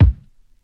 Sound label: bass drum, B key; tuned drums